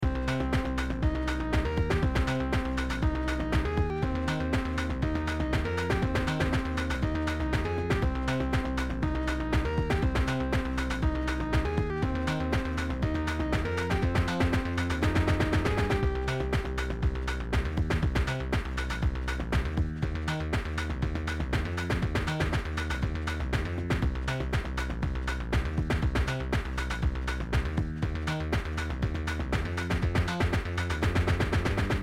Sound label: bass basstone lead sound beat